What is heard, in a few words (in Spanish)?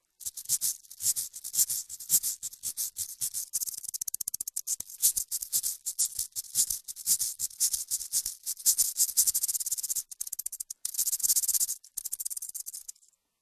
Cabasa, CABASA DE MADERA CON cuentas DE METAL